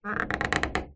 household wooden door old large heavy basement creak